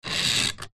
household lamp wall mounted metal bendy arm movement bend creak; zapsplat